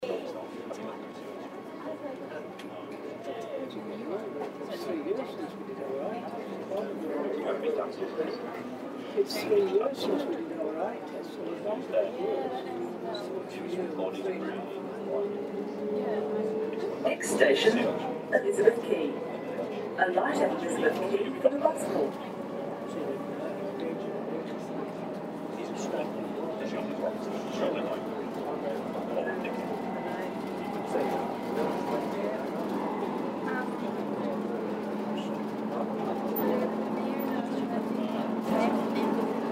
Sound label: Canning train